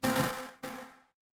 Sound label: feedback error